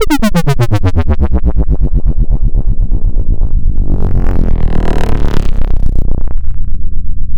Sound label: Downspiral; fabrik core